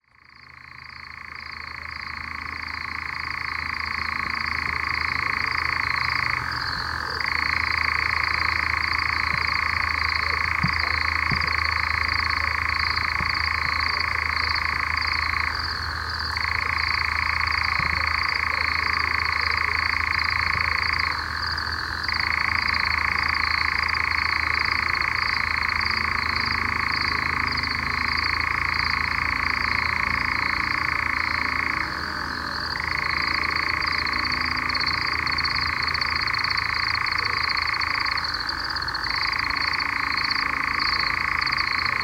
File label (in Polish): glosy ptakow lelek